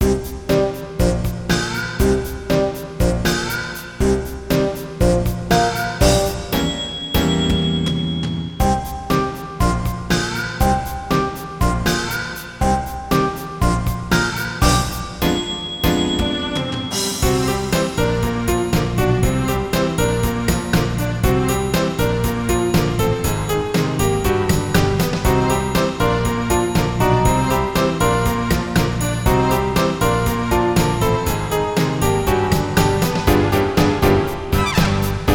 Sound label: funny ha-ha!; Konohana; TrueReport